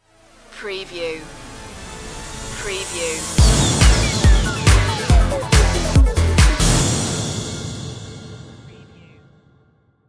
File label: tv intense stinger